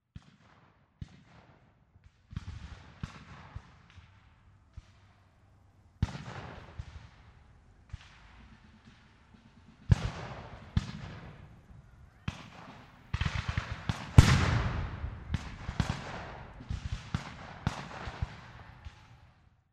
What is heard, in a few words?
back fight; Age of Empires III; cinematics